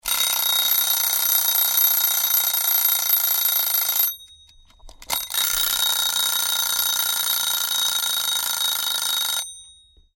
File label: Alarm Clock Ringing, travel clock; winding - Twin bell old fashioned bedside clock; Tom Hutchings